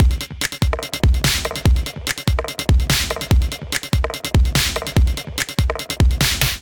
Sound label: Beat 145 bpm; MIDI Clips; Ableton; Factory Packs; Skitter and Step